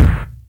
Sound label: klicker, Kicks Electro